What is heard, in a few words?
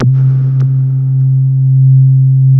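wall moog, bass; Spectrasonic Distorted Reality 1; WALL MOOG BS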